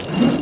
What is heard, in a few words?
Ball And Chain Whirl